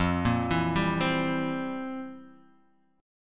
F Major 7th Suspended 2nd Suspended 4th, Guitar, DADGAD; 3,1,2,0,3,x; down; chord shape